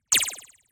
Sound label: Laser Zap